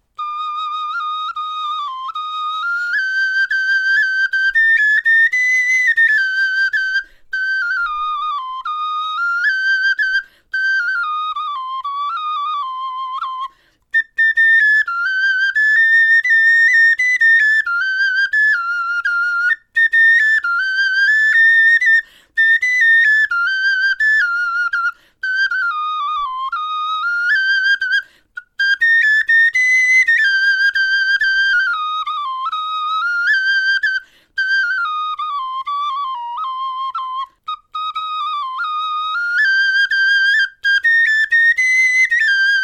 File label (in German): melodie